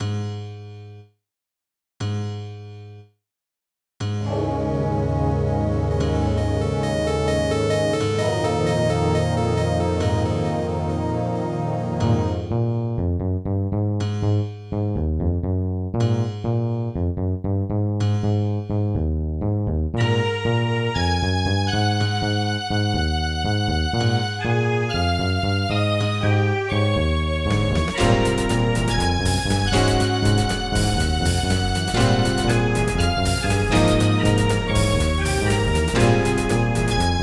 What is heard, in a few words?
Roland SC-88; SimCity 2000